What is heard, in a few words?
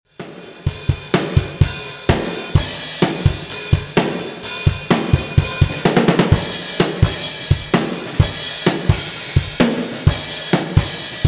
Drum Sounds